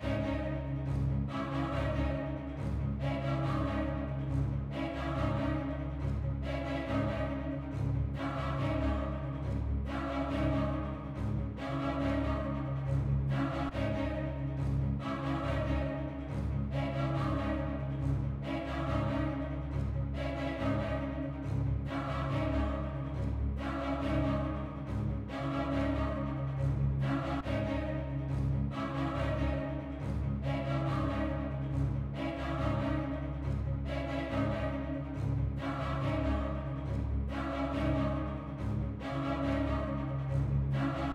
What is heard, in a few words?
140 BPM C# Min Low Strings; Cymatics - 2022 Melody USB Expansion; Spartans